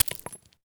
grenade gravel; STALKER; IWP

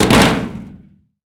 pistol drop metal thin; STALKER; IWP

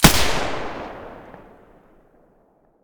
silencer g36 stereo, weapons

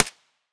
mp5 silencer mono distant

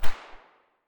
silencer p220 mono far, weapons

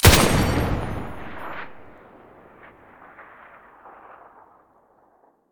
muzzle m249 stereo, weapons

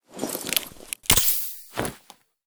stimulator use; STALKER; IWP; item usage

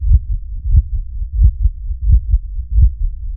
heartbeat; S.T.A.L.K.E.R.; IWP

